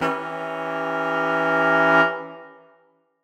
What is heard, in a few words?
Horn Swell Edim; undercover samples